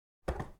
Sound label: Cat Jumping